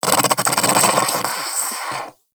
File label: Armadillo Vocal Snore; Warframe; Characters; Hunting Critters